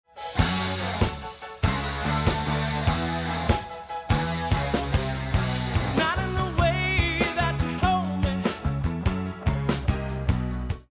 ternaire

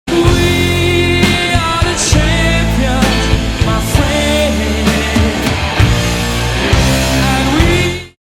ternaire

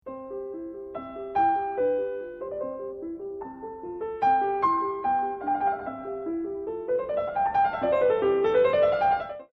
binaire, Piano sonata en do majeur; Mozart; Piano sonata n16; K.545

